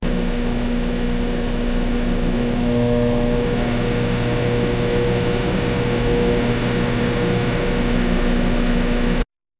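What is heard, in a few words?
volcano eruption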